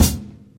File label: crispy kick one shot g sharp key; kick drum samples; one shots